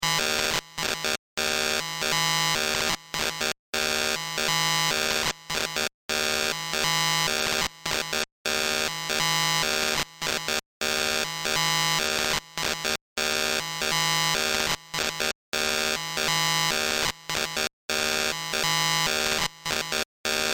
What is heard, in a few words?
Science Fiction Computer Readout Damaged